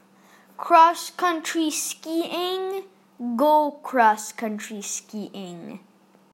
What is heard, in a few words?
cross-country skiing; Elo and Charly a cross-country ski trip